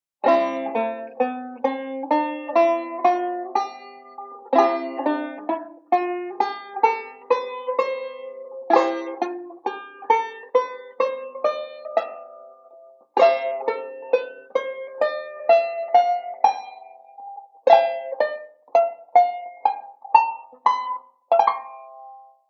C Scales Chords, banjo; Beyond Chord Melody Volume II

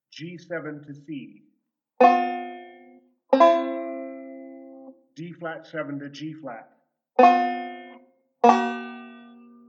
Switching Tritones, banjo; Beyond Chord Melody Volume II

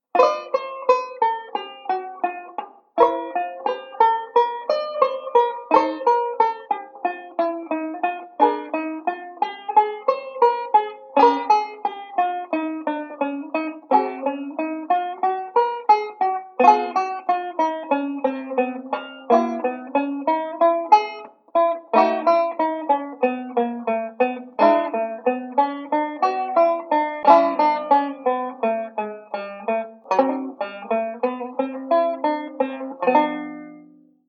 banjo; Beyond Chord Melody Volume II

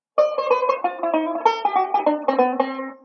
Enclosure Variation, banjo; Beyond Chord Melody Volume II

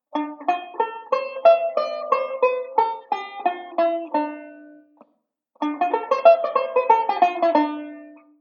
Modal Sequence; Beyond Chord Melody Volume II

